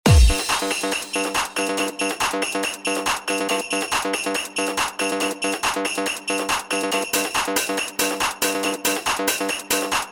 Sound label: JINGLE BELLS DJ 160